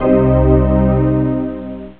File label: Fantasy Minor; Beyond HyperCard